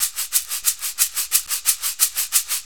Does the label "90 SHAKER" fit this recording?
Yes